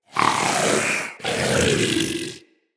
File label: Vocal Breathe Slow; Characters; Hunting Critters; Vulpaphyla